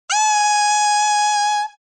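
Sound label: bass clarinet reed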